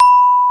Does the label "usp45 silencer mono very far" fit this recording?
No